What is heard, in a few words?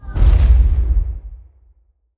bombardment explosion; Stellaris